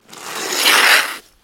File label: Snow and Ice, ice skating brake and stop